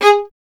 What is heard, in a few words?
STR VLN, Solo Instruments; Miroslav Vitous